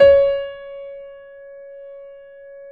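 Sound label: grand piano C#4; USB Soundscan; AKAI; Keyboards Acoustic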